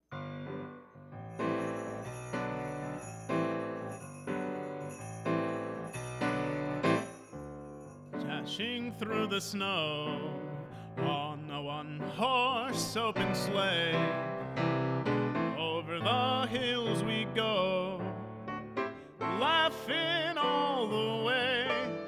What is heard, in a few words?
Jingle Bells vocal